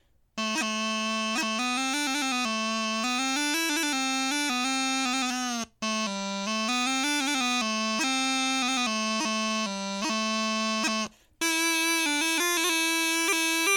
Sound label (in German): chanter melodie